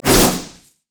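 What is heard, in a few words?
cougar basic attack; nidalee; nidalee base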